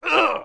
damage; byorgue; classicro

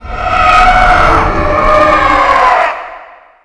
dragon fear; classicro

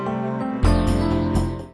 cow

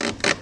dryad move; classicro

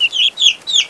picky die

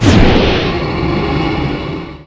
poison attack; classicro

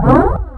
novus attack; classicro

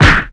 hit; classicro

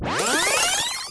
karakasa attack; free 2 play

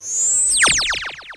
sage magic rod

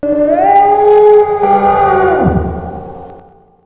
howling of lion; classicro

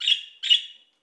wood goblin stand; classicro

